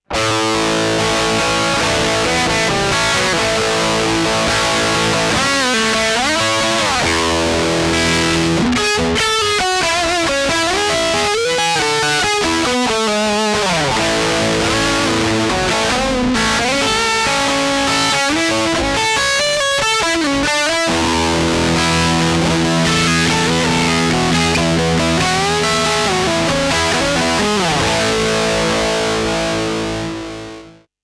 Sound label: Maxon CS9 Pro For Leads Normal